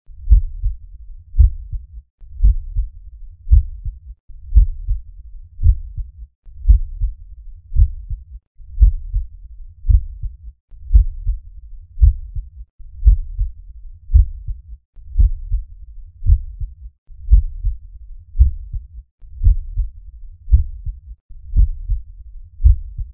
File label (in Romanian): sunet cardiac normal apex